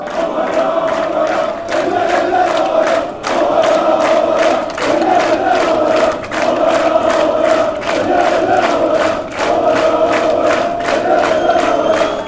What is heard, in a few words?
put your hands up in the air, chants